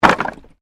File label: Wood Movement Medium; Material components for constructing more complex sounds; Materials